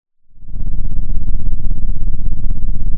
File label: Sound of an engine revving